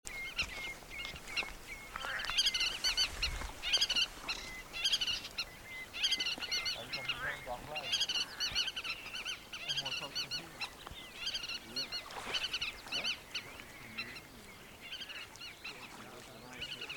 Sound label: Collared Pratincole